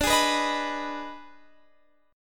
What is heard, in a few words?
D sharp Major 7th Suspended 4th Sharp 5th D